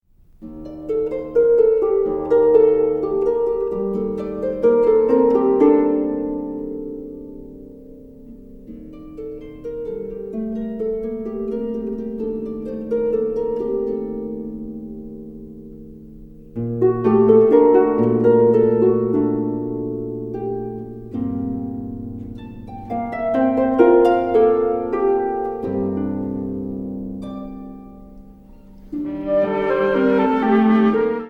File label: Trio Music